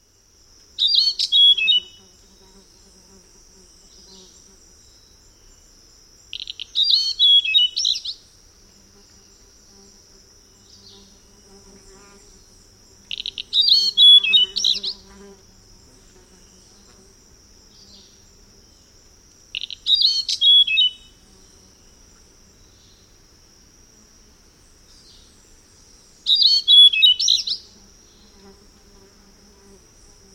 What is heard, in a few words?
Black-Headed Nightingale-Thrush; S. America